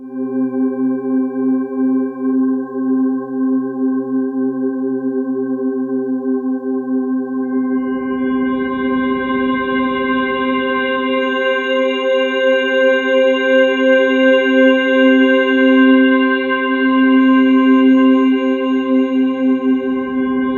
Ominous Drones; USB Soundscan vol.48; AKAI; MEDUSA

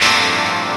CHORD, Drum & Bass, 130 BPM; Ueberschall; Drum & Bass Flash Trackz

